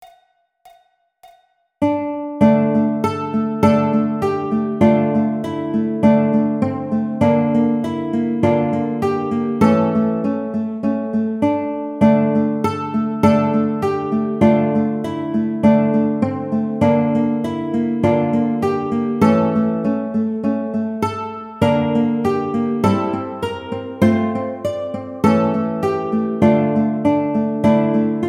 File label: Baritone, ukulele; God Rest Ye Merry